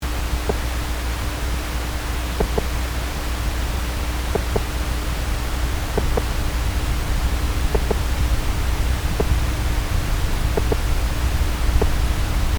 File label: Earthworm Double Recording